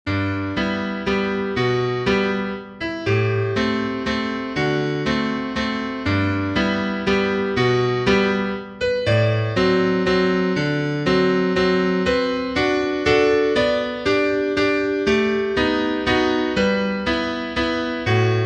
piano

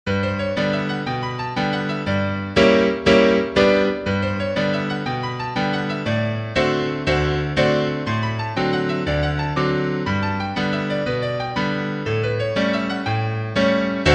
piano

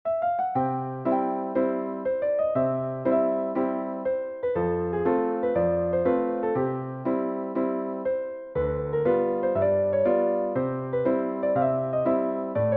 schottische piano; Pantomime

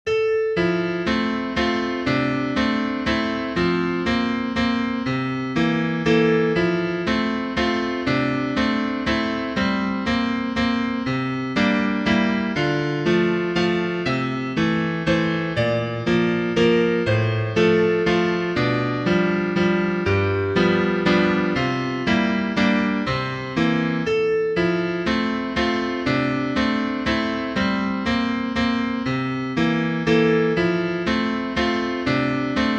melody piano

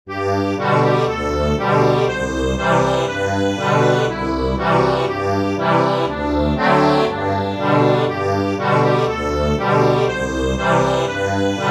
accordion, sheet music

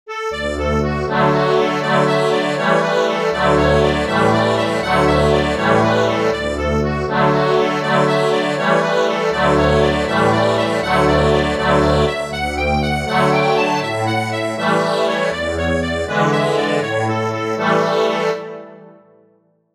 ACCORDION

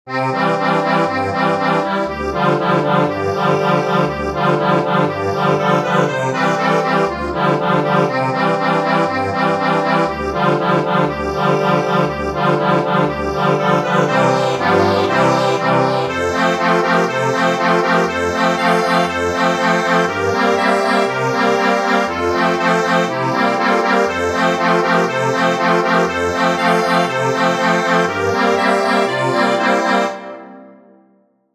accordion

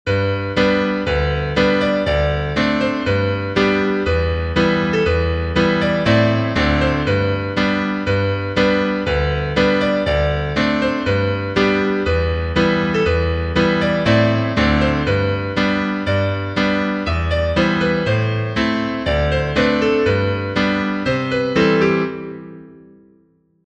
Angels from the realms of glory, lead; Iris

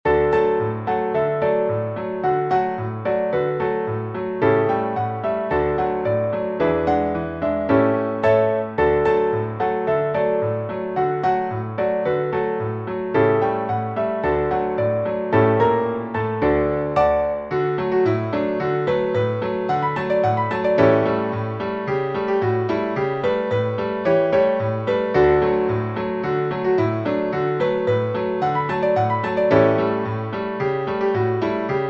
PIANO

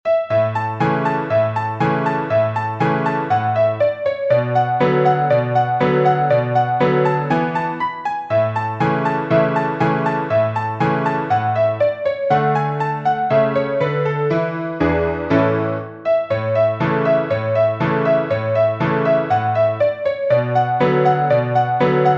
PIANO

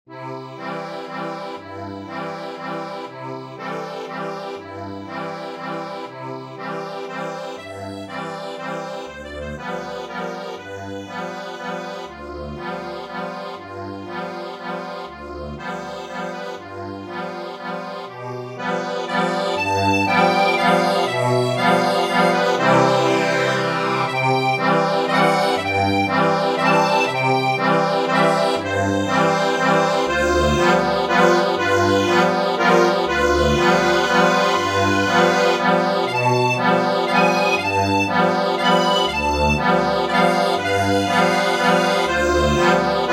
accordion

